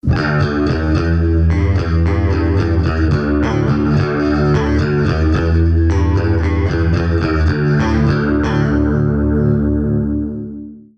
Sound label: Small Clone Chorus